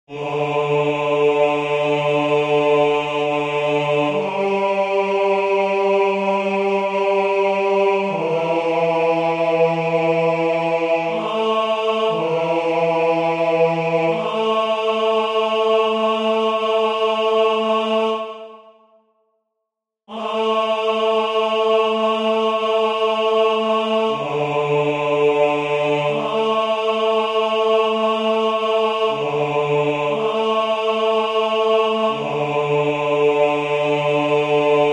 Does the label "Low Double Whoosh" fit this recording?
No